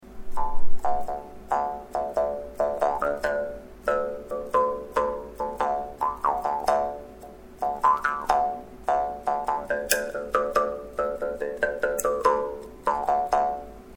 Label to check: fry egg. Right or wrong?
Wrong